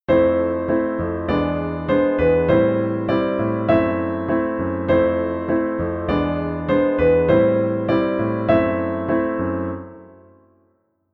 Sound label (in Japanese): 進行の起伏 FM7 E7 Am7 Fm7b5, piano